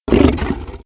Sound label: Lion Attack; Playable Tutorial Level